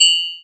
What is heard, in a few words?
coins thief; Politburo